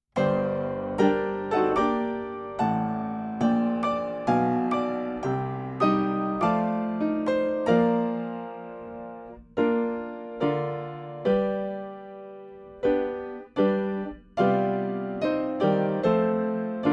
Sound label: Section A Accompaniment